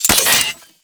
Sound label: Sniper Rifle Alt Mech; Warframe; Weapons; Corpus